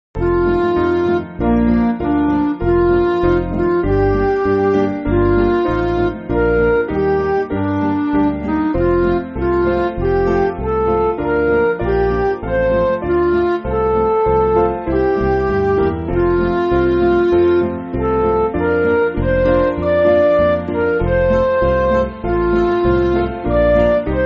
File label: Midi